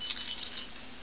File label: m134 chain